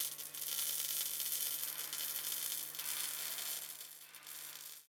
electrical; CrossCode; battle

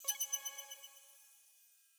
logo instant bling quick

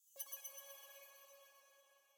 logo bling

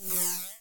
launch; World Of Goo

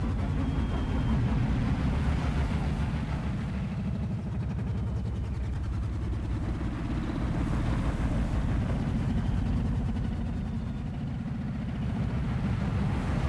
cars atmo

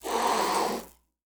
hiss; CrossCode; battle; shokat

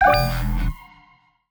wave mode

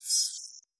beep interaction go; The Swapper

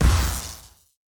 hit shock medium; CrossCode; battle

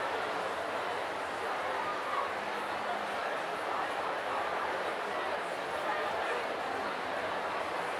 crowd ambience, arena; CrossCode

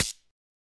hit shock light; CrossCode; battle

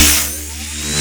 shock charge

